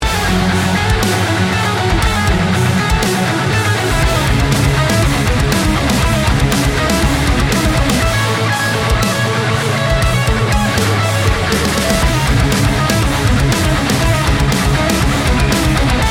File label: Instrumental